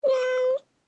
Distressed cat meow chirp; animal; gfxsounds

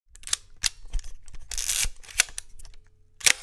Camera case handling backpack

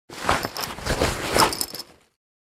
School bag backpack handling movement; luggage

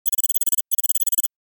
Futuristic data

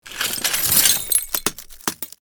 Glass shards and debris

